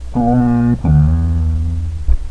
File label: short Gentle Fail Sound